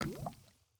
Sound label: drip lava cauldron, pointed dripstone; Minecraft